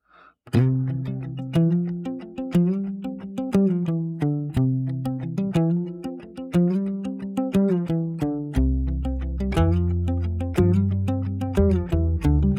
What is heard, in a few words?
acoustic